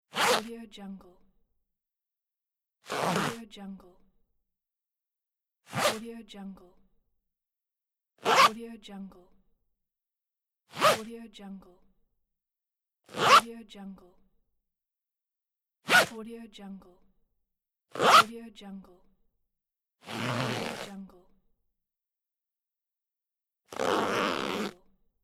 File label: trouser zip